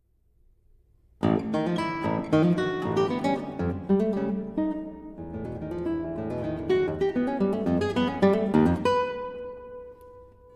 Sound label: fast and playful